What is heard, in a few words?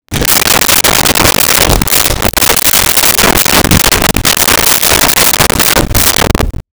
Unplug Blow Dryer and Put Away; Bathroom